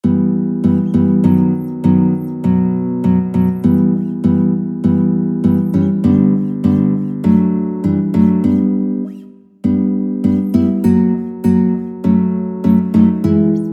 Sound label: allegretto, guitar; beet